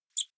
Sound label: alert call audible